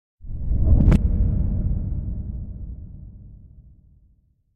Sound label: Impact Deep Reversed Air Hit; Hollywood Edge; sound designer's toolkit v2